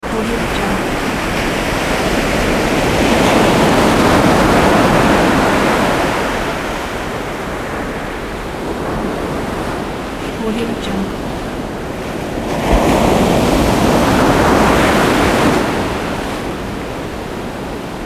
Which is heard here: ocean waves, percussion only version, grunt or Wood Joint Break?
ocean waves